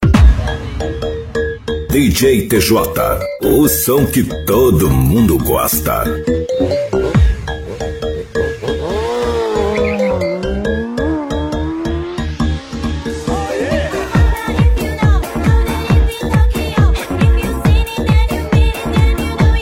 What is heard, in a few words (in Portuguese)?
Som Automotivo Rebaixados Pancadao